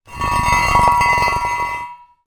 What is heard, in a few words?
metal post driver drag concrete ground; industrial